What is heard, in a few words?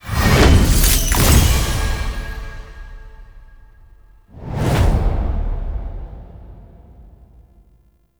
Tutorial Progress, UI; Warframe; Awakening Remaster